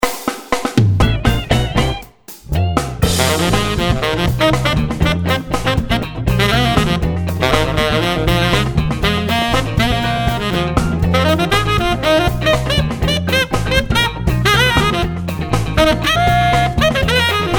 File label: Tenor Funk; gottsu